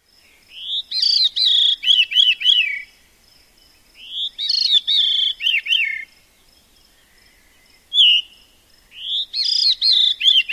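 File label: Veery, song and call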